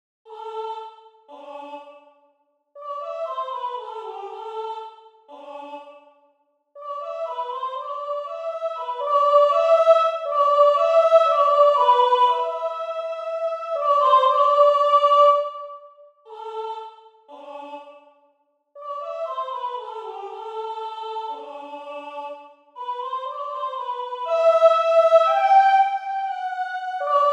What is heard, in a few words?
soprano